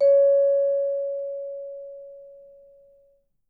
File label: C#5, Percussion & Harp; Miroslav Vitous